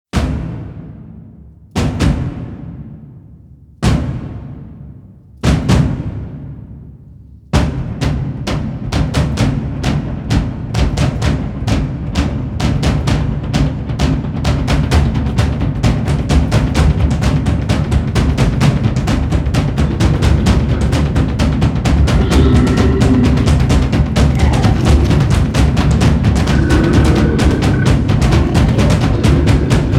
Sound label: Drums in the Deep